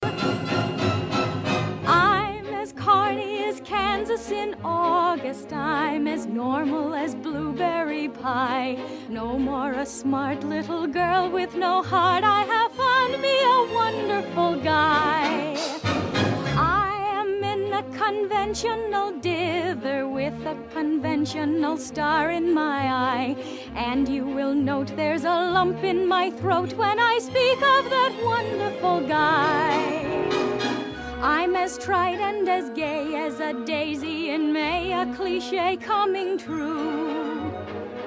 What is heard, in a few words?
singer; south pacific